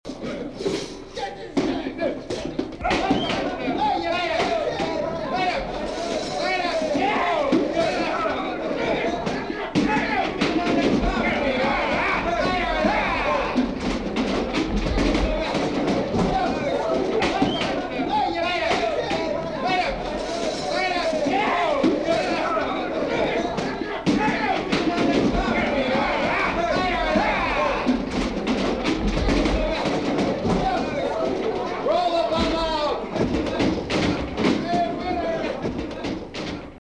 pleito

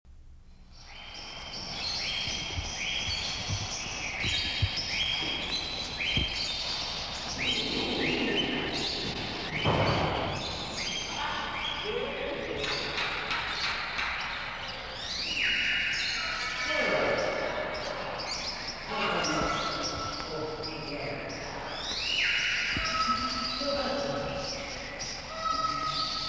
aves

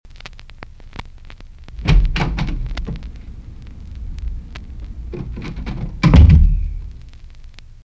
puert

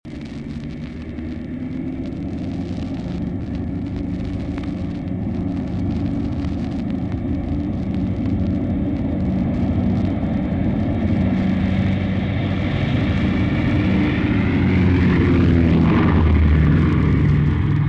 fumig

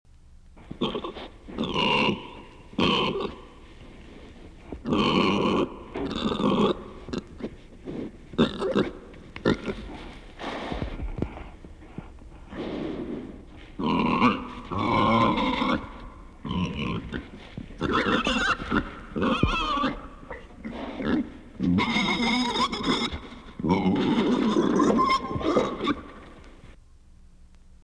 cerdos

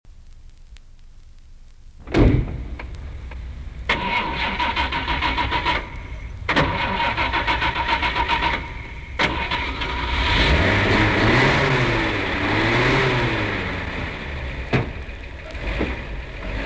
carro

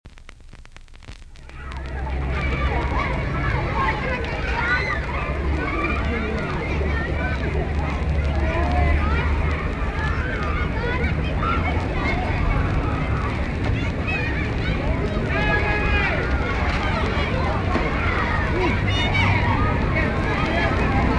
niños

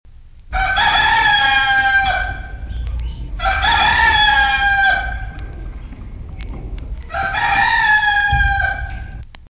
gallo

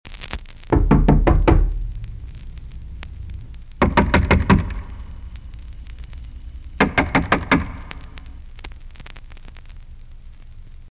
puerta

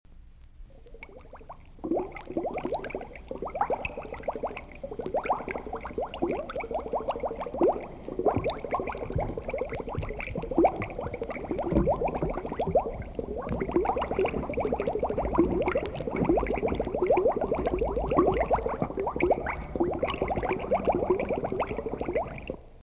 burbuj

